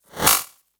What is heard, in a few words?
Glitch, noise